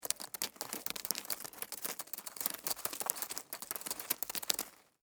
Spider Walk Dirt, Spider, Walk, Dirt, Fast, Arachnid, Insect, Bug; Sound Effects:Animals:Insects; Spiders; Blastwave